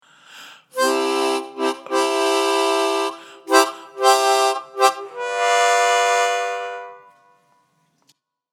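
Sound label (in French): impro solo harmonica; loto sonore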